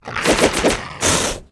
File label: attack